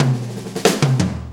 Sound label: 4-4 Fill, Drum Loops